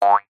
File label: cartoon bounce jump spring single jaw harp